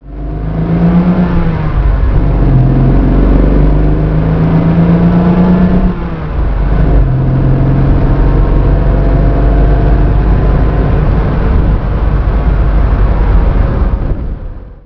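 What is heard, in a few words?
Lancia Flaminia GTL 3C drive; 1965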